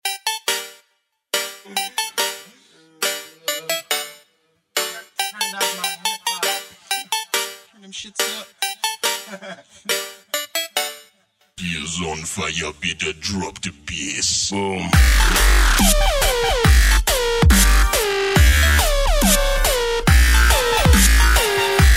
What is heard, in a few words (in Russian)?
prikolnyy neobychnyy dubstep rington